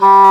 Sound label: WND English Horn; Roland LCDP04 Orchestral Winds